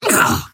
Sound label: pain big